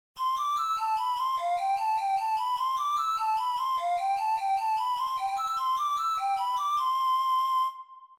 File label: Ocarina